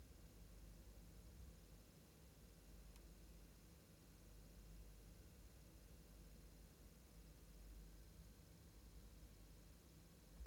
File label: noise